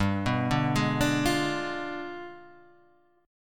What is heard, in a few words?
G 7th Flat 9th; arpeggiated; JGuitar